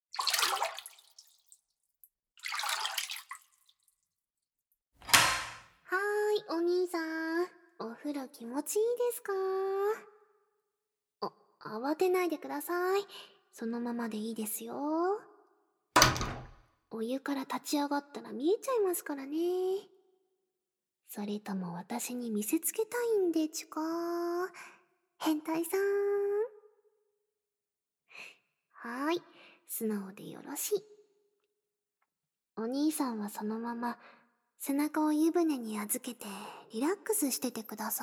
アロマオイルでマッサージ, ASMR

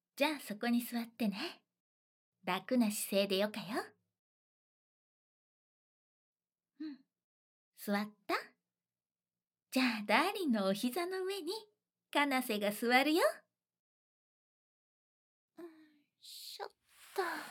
耳舐めしながらスマタ攻撃

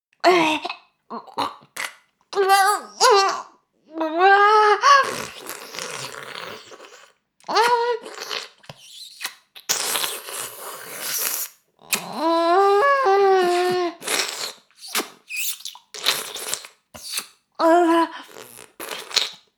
フェラ音声; 牧場戦腺; 少尉

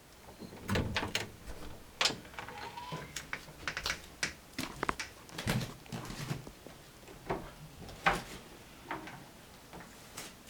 コーヒー飲んでからおしっこしちゃうの

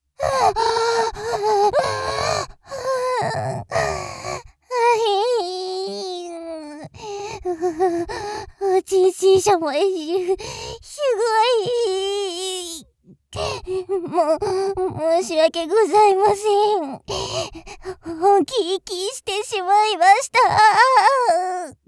はぁっ♥はぁっ♥あひぃ…♥

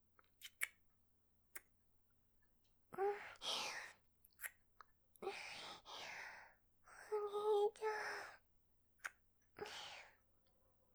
キス ノーマルキス

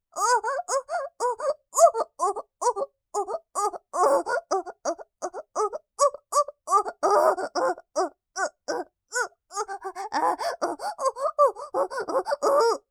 おっ！♥おっ！♥おっ！♥おっ！♥

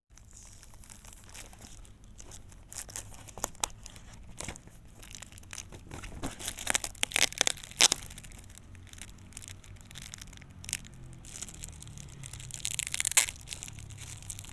花火の袋を開ける＋バイクや車が通り過ぎる; 夏実と縁側花火デート

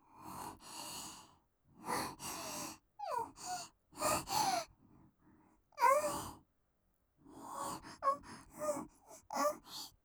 喘ぎ・おおかみっぽく; 台詞系

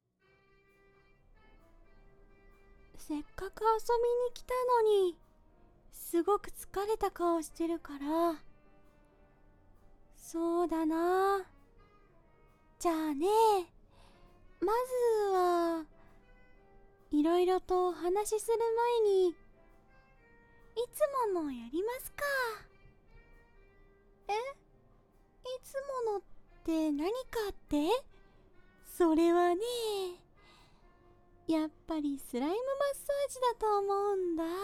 スライムマッサージ(両耳同時); いつものやるよ♪; スライム娘ちゃんの出張リラクゼーションサロン！?; 声あり

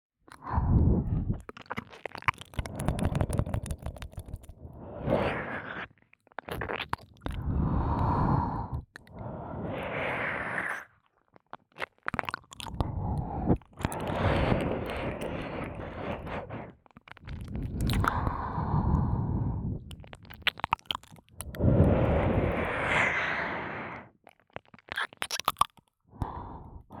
吸い込み耳舐め 左耳; 耳舐めコレクション

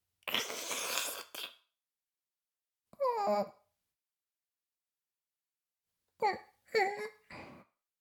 フェラ音声; おにいちゃんアイスぅー！

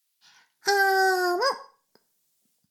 フェラ音声; 古き城のドラゴニート2

